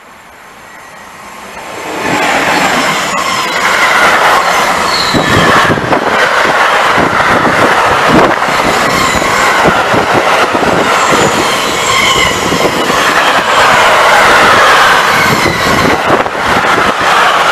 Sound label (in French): passage train fret sncf